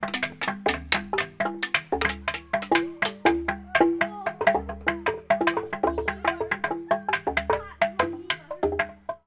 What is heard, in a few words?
musical large xylophone forest children play chat scotland